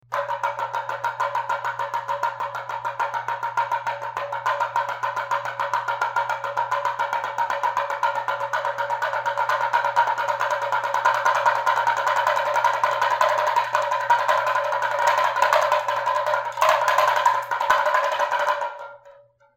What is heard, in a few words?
damaru